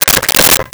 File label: Telephone Check for Dial Tone; Communications